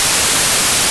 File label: White noise stereo